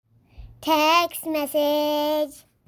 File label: Text Msg